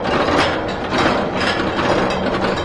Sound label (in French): loop roller cremaillere, rollercoaster